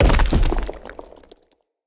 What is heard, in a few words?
Pillar Stone Medium Destroy Chunk